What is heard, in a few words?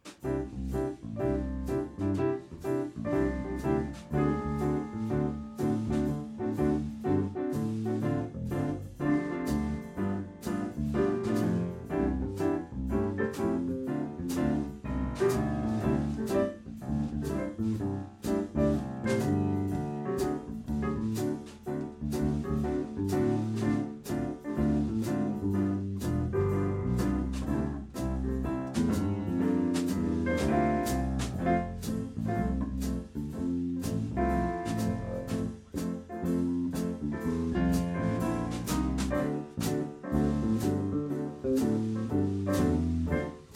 jazz Solo